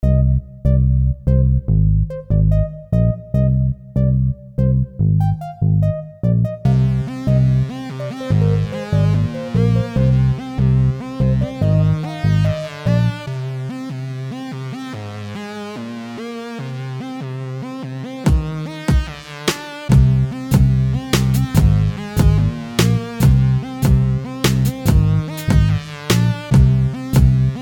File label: electro 145BPM